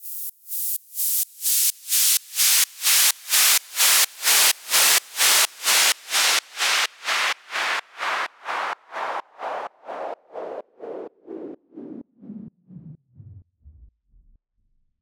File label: Fall Side Chained